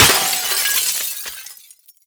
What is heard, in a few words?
break glass phys large; physics; breaking